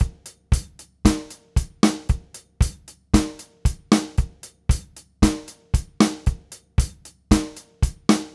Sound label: Steppers, Drumset; Sonic Foundry (Sony Creative Software); Joe Vitale Latin Percussion